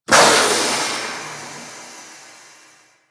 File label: Settlement Valve Open; Ambience; Warframe; Grineer Settlement